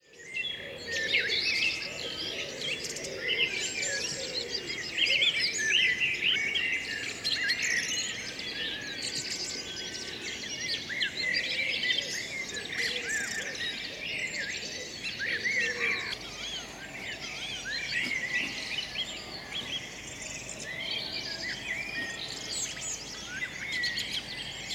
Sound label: Bird, Soundscape